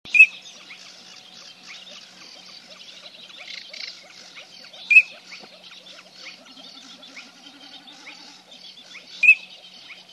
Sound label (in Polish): cyraneczka, głosy; wroblowe; anseri